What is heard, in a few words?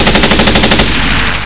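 machine gun; circ7000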